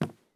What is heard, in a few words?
walk wood; Escape from Tarkov